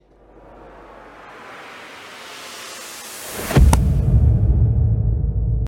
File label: Boom